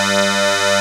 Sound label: DIGI BASS